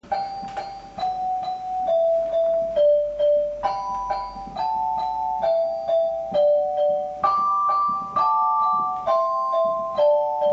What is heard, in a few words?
Easter Alleluia w celesta